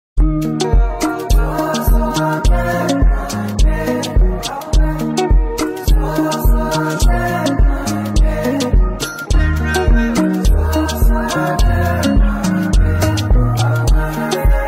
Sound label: Instrumental with Hook